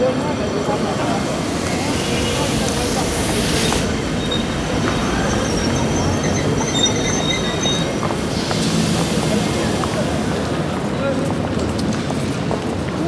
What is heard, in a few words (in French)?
gare; MOBICLIC 13